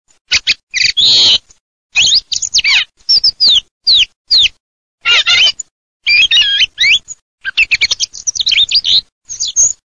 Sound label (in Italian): TORDO